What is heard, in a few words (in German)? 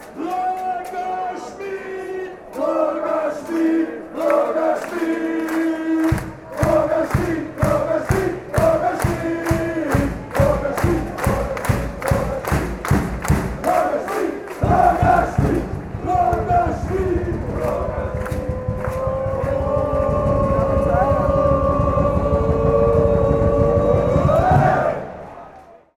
salzburg fangesang